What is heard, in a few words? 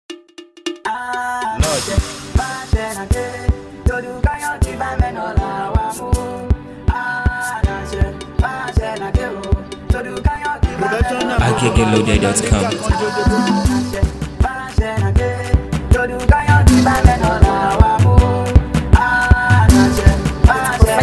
Igala Tribe Beat